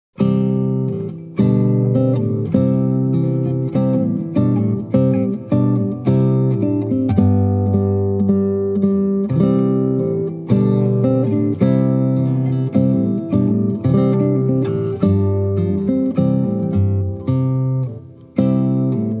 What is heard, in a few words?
trumpet